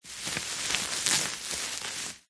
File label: plastic packaging film bubble wrap handling movements; office